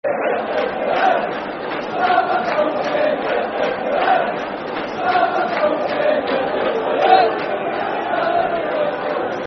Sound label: Football Chant (Soccer Song), Hibernian; Grzegorz Szamotulski; Hibernian Hfc